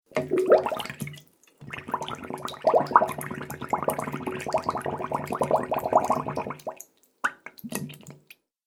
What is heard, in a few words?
Water Bubble Sounds